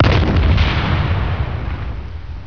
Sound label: Ship Death; PegLeg™ Demo; Changeling Times